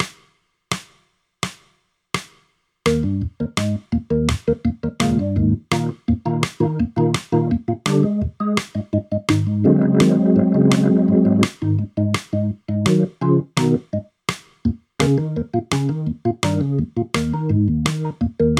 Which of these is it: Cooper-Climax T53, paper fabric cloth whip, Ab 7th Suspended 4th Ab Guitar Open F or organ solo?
organ solo